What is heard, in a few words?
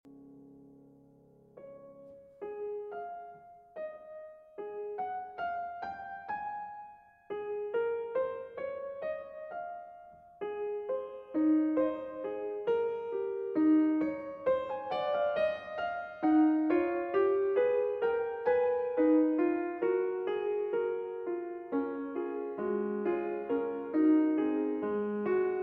Piano Sonata No. 6 in C-Sharp Minor V. Fuga. Moderato